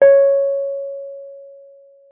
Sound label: WURLITZER C#5; Xsample VOL-1; WURLITZER B